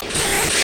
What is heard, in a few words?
zipper; best service Blue Box; mixed sounds short